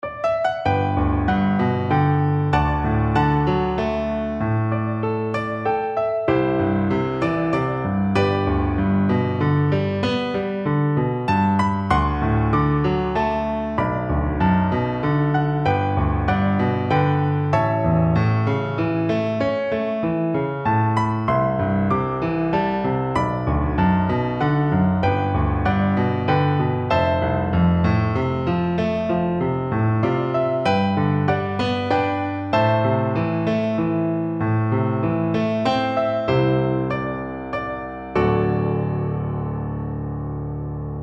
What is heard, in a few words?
she moved, piano